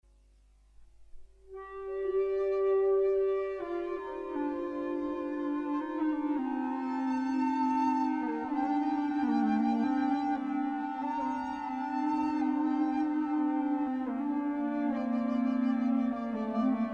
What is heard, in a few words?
ebow flute